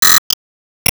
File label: Enemy Fashion Skill Change